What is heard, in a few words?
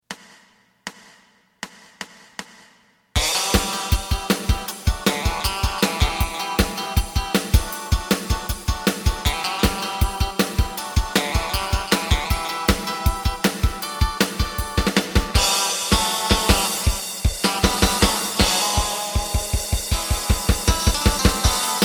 bass